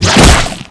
Lady Bug Die

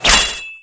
Weapon spear Fire

